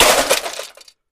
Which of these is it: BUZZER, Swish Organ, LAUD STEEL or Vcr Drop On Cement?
Vcr Drop On Cement